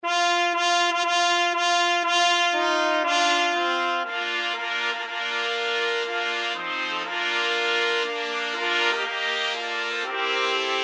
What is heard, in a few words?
wagner wedding march trumpet quartet